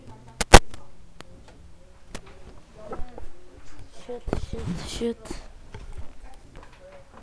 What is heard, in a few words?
shoot